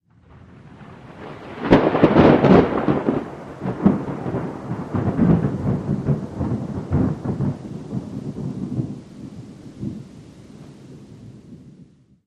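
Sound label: Thunder Crack Rolling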